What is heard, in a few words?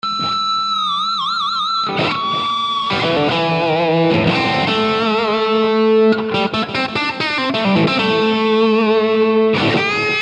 crunch tone blues strat sim analog; line6; tonecore; echopark; simulanalog